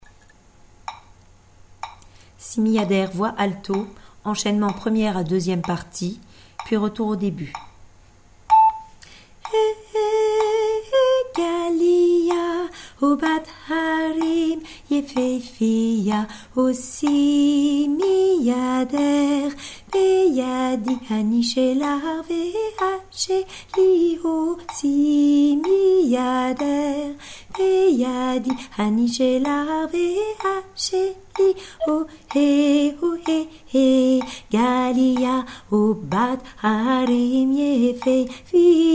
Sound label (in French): alto